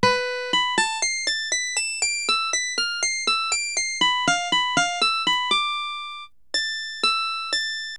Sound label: battle win; RPG